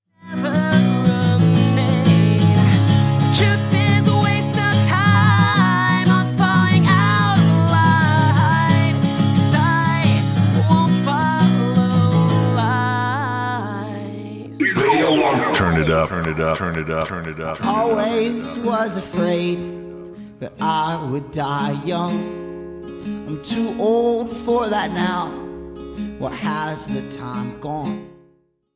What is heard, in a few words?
gospel choir